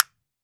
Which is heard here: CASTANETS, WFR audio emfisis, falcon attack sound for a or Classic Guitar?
CASTANETS